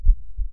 heart sound

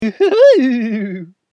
oohoo oo oo oo oo oo

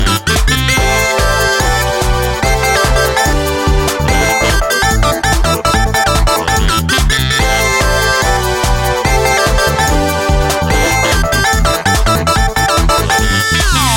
rave

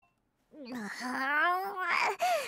noises; gabriel dropout; satania

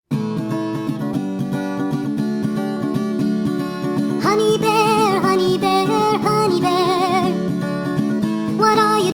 honey bear duck song

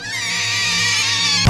scream

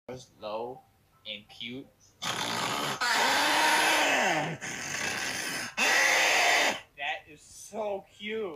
flamingo snores low and cute; roblox

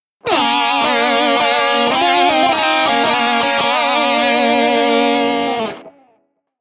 tapedeck

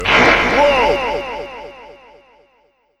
woah

